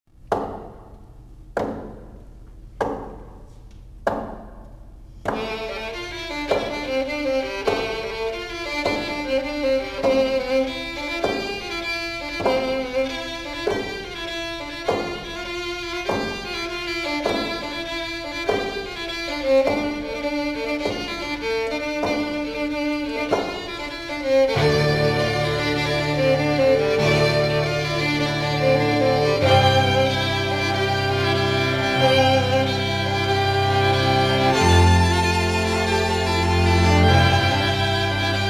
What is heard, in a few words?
string quartet; Last of the Mohicans; Dallas TX